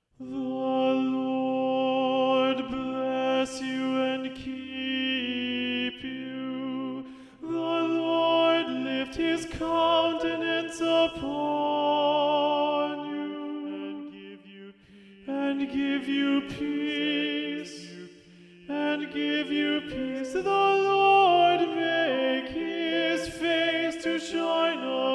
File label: Alto Predominant; choirs